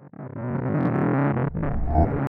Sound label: Movements; 99Sounds; The Weird Side Samples